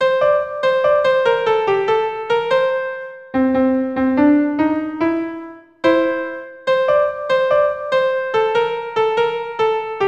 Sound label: melody